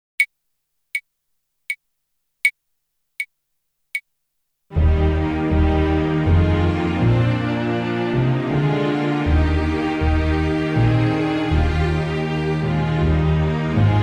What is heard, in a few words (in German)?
Die Britische Nationalhymne